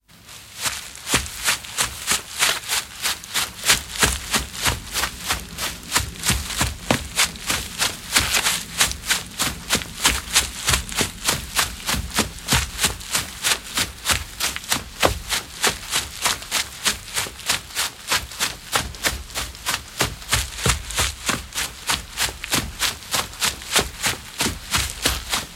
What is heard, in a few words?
footsteps running